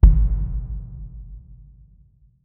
bass drum boom with rever

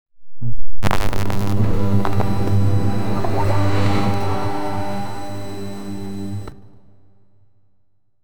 futuristic style with ech; electric

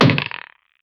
mobile dice game when dic

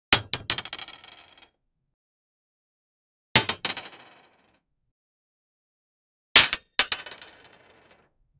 dropping a big metal obje

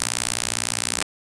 electricity short circuit

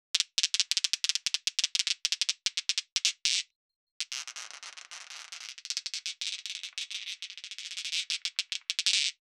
a reptilian creature titl

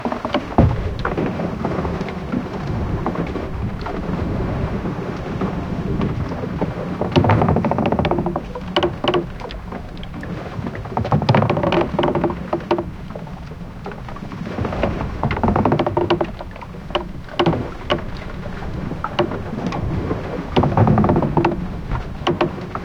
wooden rowboat in rough o, water splashing

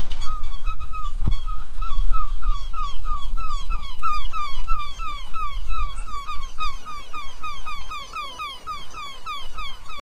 seagull sound on a rocky

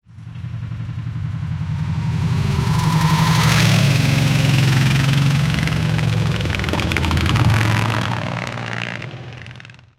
50s harley davidson dri, motorcycle

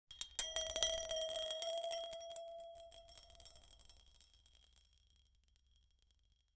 a gold ring falling in, velvet

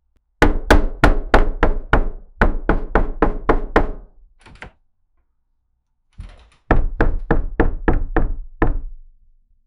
frantic banging on a wood; building

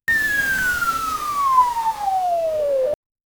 toy choo, toys

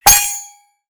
ui

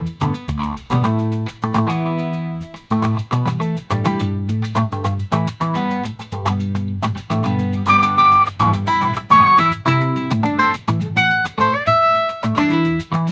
blues guitar strumming

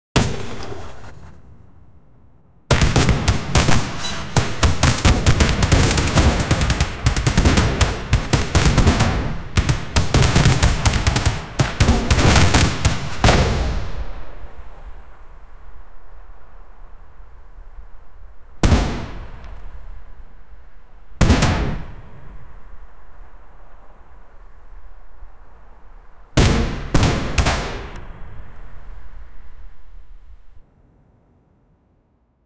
soft medieval viking, cannon shot

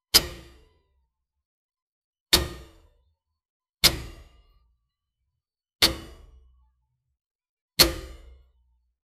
sharp metallic clatter

